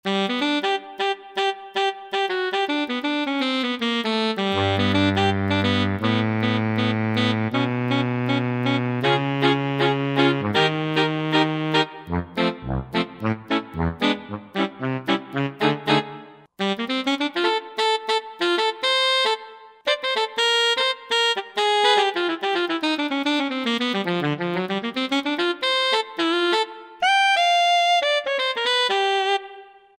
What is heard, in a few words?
Alto Sax, Roland JV-2080